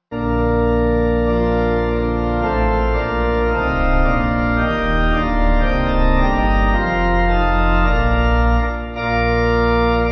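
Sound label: Pipe organ, church music backing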